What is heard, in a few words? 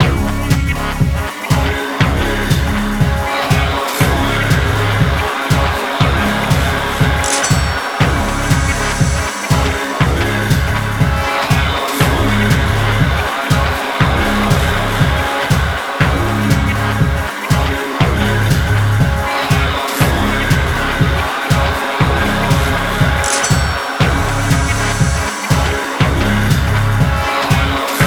dollar beat